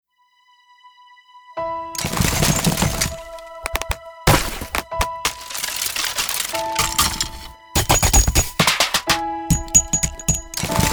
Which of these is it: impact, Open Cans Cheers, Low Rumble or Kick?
impact